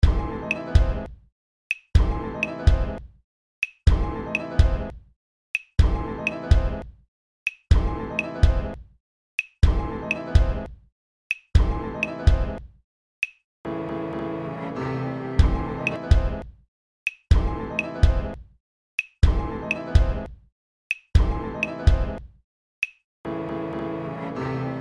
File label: instrumental; 2006 glitch instrumentals